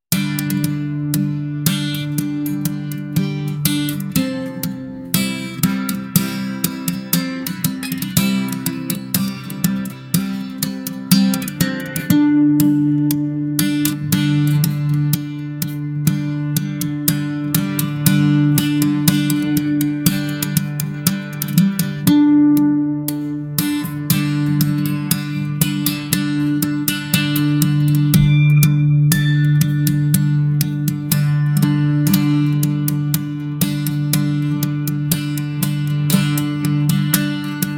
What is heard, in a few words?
guitar campfire; winter nights cold ice cup a soup and a coffee